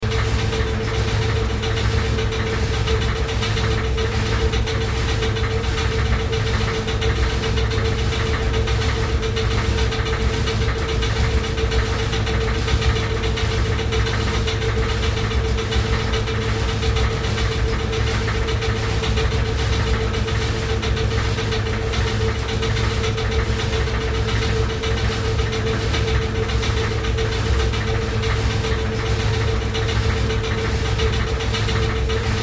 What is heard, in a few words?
Washer Machine, Washer Machine Washing Run Clothes Loop; Appliance; Laundry; Household; Blastwave